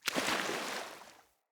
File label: Sandals Land Water, footsteps; Player